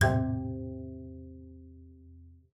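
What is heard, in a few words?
bass col legno C3; Xsample VOL-5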